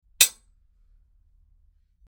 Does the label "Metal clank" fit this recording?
Yes